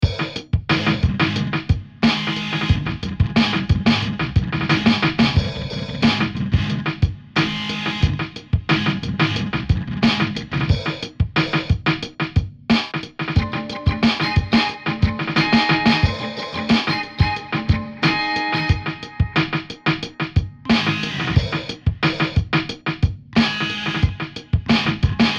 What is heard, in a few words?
Gutiar FX